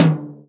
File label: tom, Real Drum Kit, jungle kit